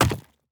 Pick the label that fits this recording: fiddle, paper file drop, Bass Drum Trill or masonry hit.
masonry hit